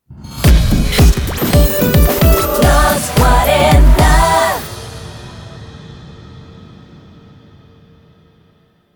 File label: ID RAPID; LOS 40 PRINCIPALES